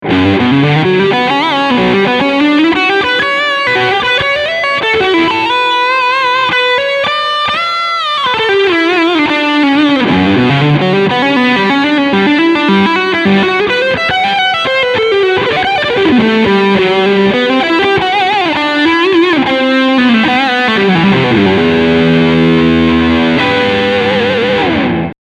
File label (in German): Leadsound Steg Humbucker